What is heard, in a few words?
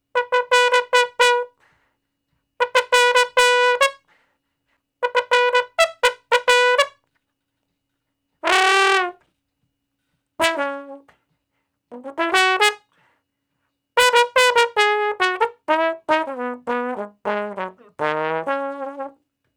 Trombone Straight (Db); Sonic Foundry (Sony Creative Software); Horncraft for R&B; Solos